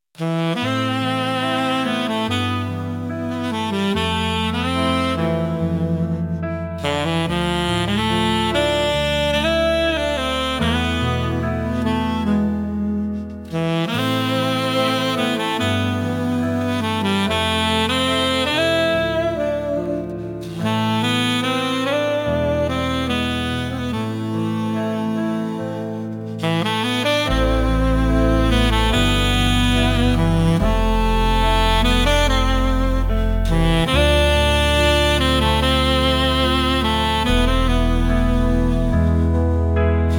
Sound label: sax serenity